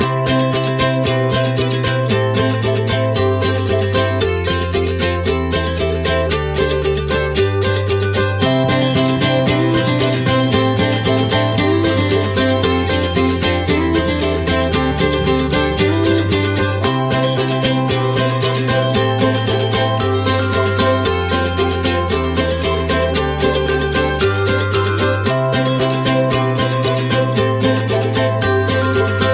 Upbeat Acoustic Christmas, Loop No Drums

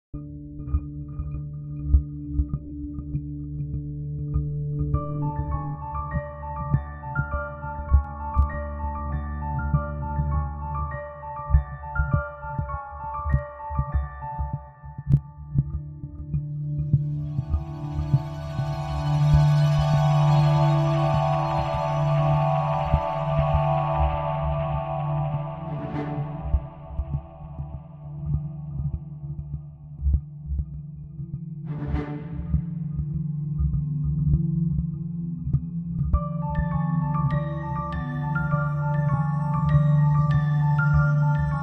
Abstract Tense

